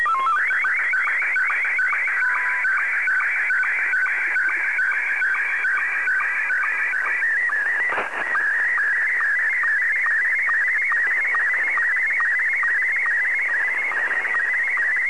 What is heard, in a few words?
sstv